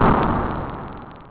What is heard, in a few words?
EXPLODE